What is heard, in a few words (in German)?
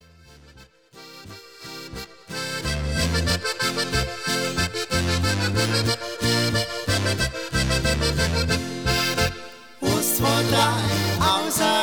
Samstag-Boarischer